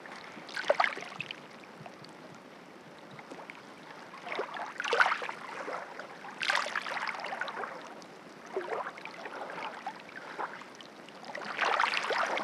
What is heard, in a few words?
Water At The Beach